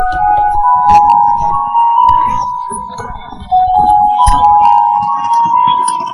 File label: break; LumiCu 2.0; minecraft